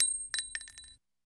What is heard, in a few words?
shell, g2contender; weapons